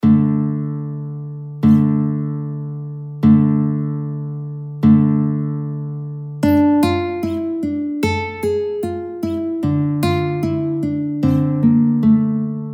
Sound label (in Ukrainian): kobza; bandurka; VKushpet; Mamajivska kobza